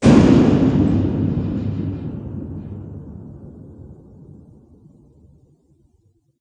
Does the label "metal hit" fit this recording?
Yes